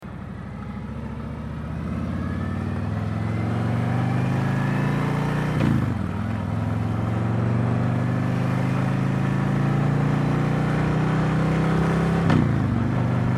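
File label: Stock Touring, motorcycle exhaust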